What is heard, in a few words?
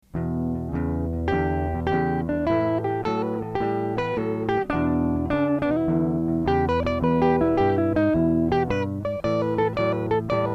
Electric Blues